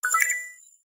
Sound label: Chime; CtOS